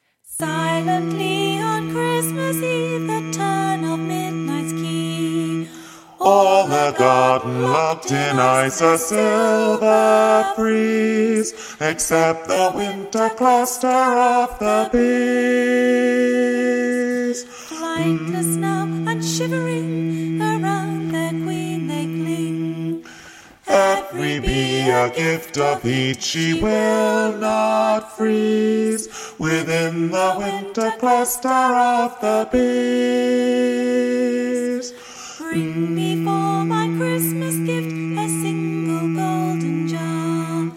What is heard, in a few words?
Winter Cluster Bass